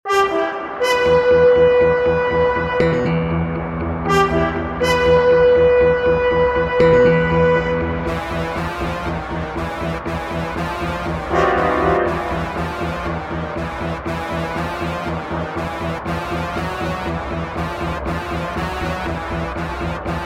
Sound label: First Fight Music